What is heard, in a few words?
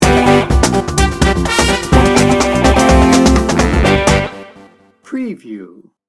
hectic hip hop sting bumper